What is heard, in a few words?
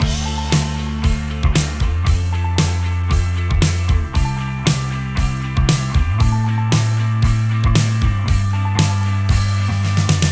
Indie